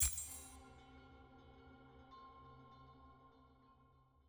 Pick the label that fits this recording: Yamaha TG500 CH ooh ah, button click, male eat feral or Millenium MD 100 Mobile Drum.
button click